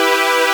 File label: Synth Shot E